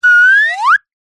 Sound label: cartoon slide whistle ascend climb fast